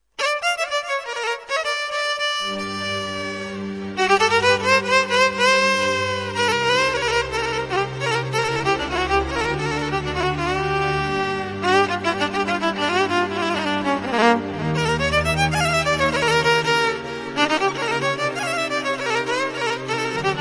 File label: violin